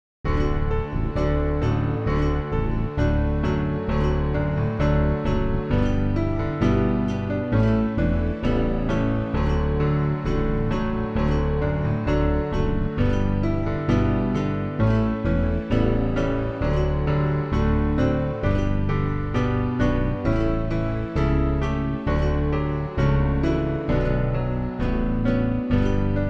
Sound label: Our Welcome Song (backing); New Hope Music